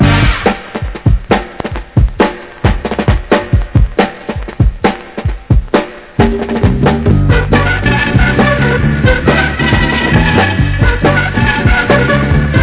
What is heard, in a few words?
Happy Soul